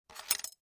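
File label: knitting sounds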